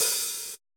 cymbal, Roland MC-909

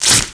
TORN PAP; Total Control - Action Cutz; REACTION

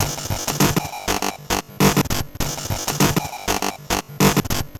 Bent RX17 (100BPM), Circuit Bent

